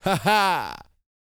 LAUGH, Vocal Jazz Fx

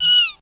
VOX; ZERO-G; Datafile

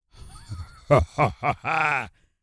LAUGH, Vocal Jazz Fx

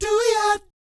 DOOEEOT, Vocal Jazz Fx

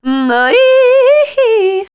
voice spectral, ly short

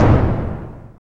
TOM

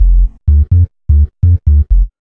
B LOOP

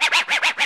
SCRAT; E-Lab; X Static Goldmine 2; akai

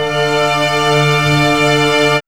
STRCHD

